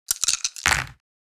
Board game map dice toss and roll; leisure

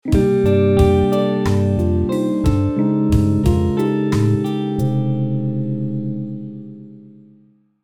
Nostalgic theme sentimental sitcom music

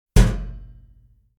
Cartoon accent impact thud

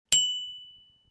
Subscribe bell ding; shorts sound effects

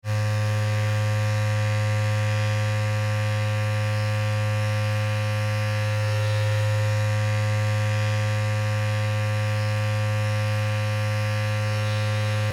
Electric hair trimmer buzzing long

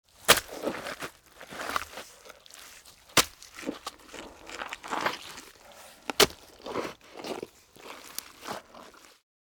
Striking a dirt surface with a hoe cultivate

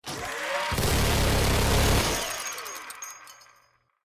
Minigun machine gun burst close perspective

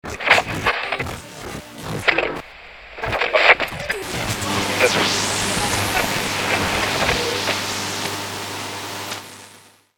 Old radio tuning crackly frequency sweep

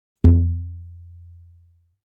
Elastic rubber band pluck

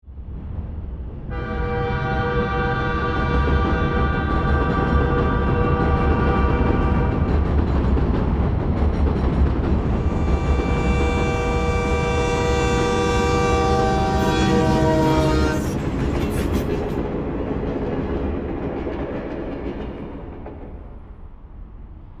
Train horn blast distant double; vehicle

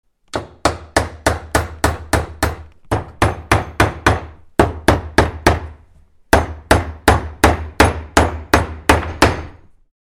Worksite ambience hammering noise

